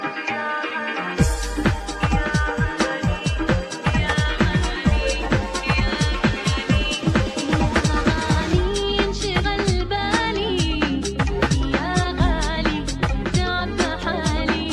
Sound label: Arabic Tone